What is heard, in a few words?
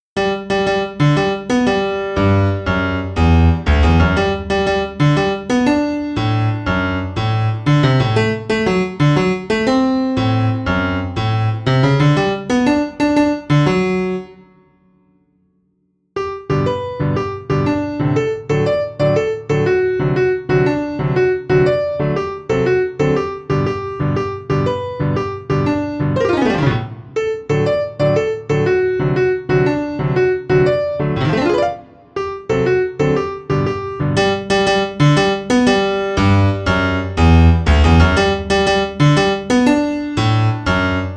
forwards piano; kupredu